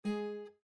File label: Windows Information Bar; Windows 7 Sounds; Raga